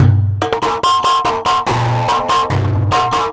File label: Hand Drum Distorted